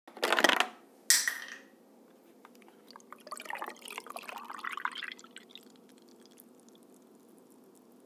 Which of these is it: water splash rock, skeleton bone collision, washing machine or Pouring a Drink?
Pouring a Drink